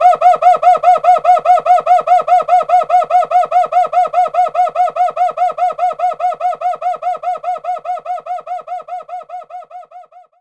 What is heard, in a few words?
Cuica Mute; knights try